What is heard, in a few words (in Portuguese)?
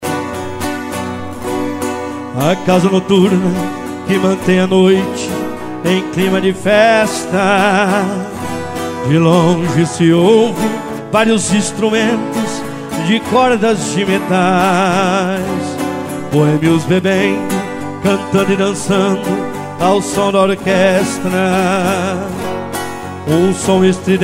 viola caipira